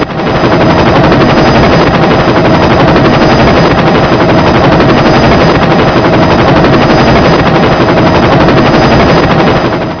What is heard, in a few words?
chopper